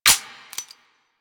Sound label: Revolver Pistol Mech